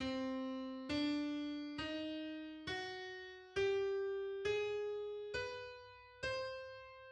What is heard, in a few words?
Hungarian gypsy scale C